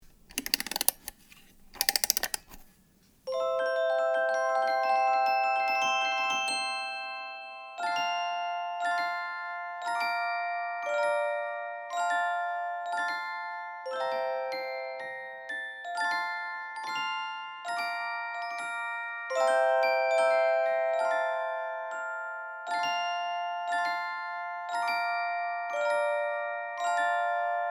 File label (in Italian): CARILLON